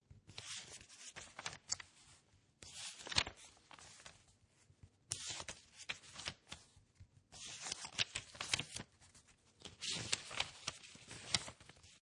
Paper Turn Pages Multiple, Magazine or book, turn pages, several page turns.; Classroom; Schools